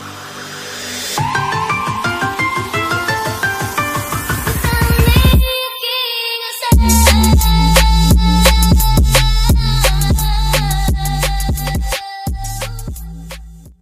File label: drum bass